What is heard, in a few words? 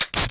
medic use; WarRock